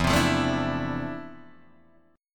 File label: F Major 13th E, guitar chord